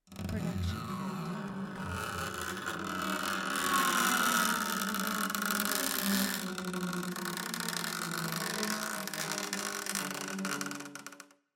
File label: horror scrape; soundscrate